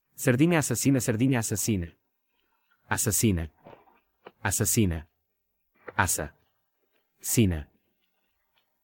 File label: loop; sardina assassina